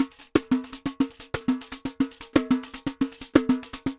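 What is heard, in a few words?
pandeiro, DRUMS SAMBA; Best Service ProSamples vol.14; AKAI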